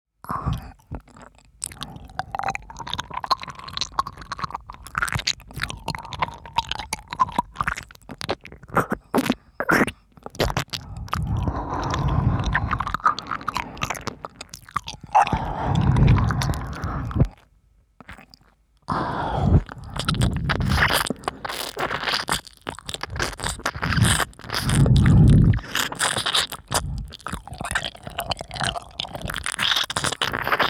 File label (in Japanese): 左耳舐めループ用; 小林さん